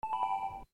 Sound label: SMS in Call; LG KH5800